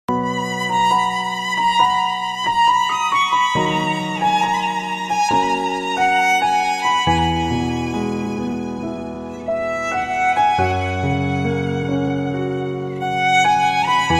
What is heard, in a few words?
instrumental ringtone flute ringtone; srk